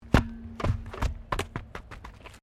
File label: WRECK WHEEL STANDARD BOUNCE, Wrecked Cars; Wrecked Cars, impacts, crashes, scrapes; Crashes (Sound Effects:Objects)